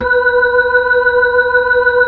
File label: B-3, Brite; Keyboards of The 60's and 70's